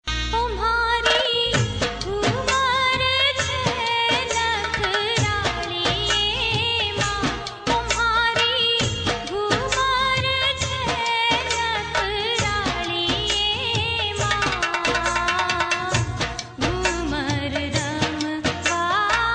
ghoomar rajasthani ringtone

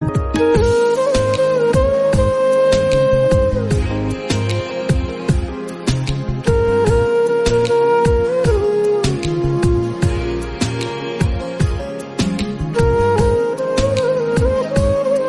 Instrumental Ringtone

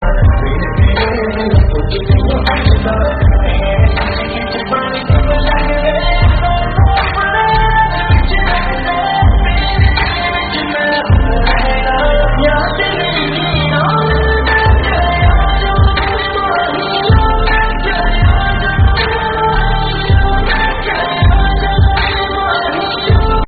Slowed Reverb

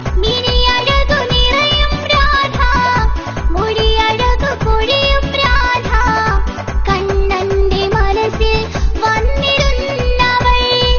Krishna Malayalam

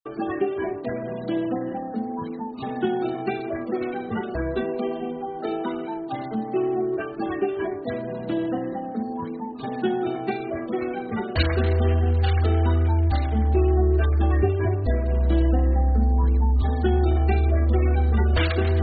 Instrumental

